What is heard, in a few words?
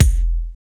KIK SIZZL, Drums; AMG; Now CD-ROM (Roland)